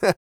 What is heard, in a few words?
LAUGH, Vocal Jazz Fx